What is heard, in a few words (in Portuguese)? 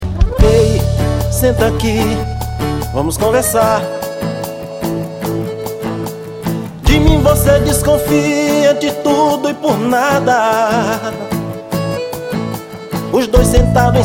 ao vivo, sertanejo